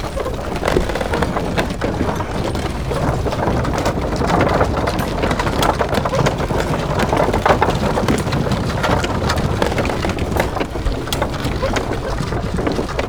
rampart gate open

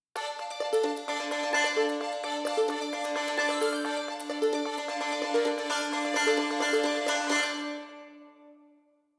Combat In; Novigrad

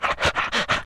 Dog Idle

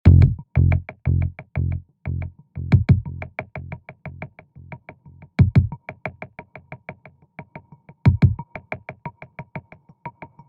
Distorted Echoes; APM

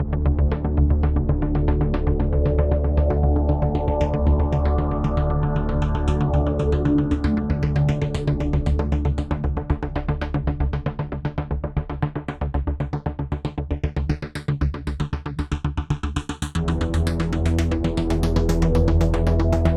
Harsh Industrial Train Crash; Half-Life: Alyx

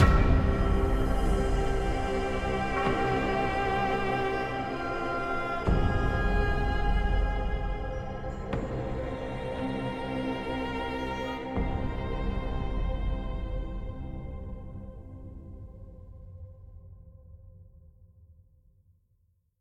Prague Sewer Stinger; Deus Ex Mankind Divided

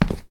Tile long

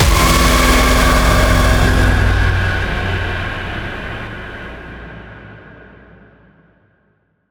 Jump Scare; Asylum

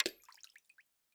Shell Water

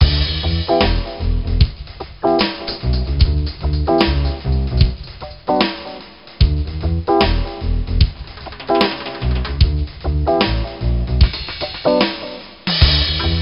Jazz; Nancy Drew: Secrets Can Kill

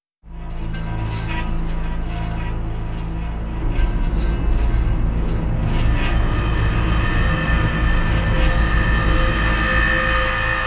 Death Stinger

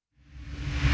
music combat intro

